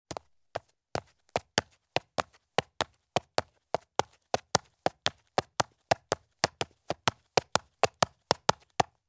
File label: Women's Flat Shoes with Low Heel Descend Quickly with Gallop Down Concrete Stairs; Sound Ideas; Footstep Sound Effects